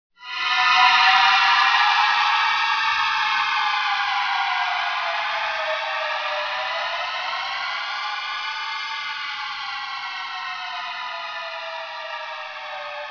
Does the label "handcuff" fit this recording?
No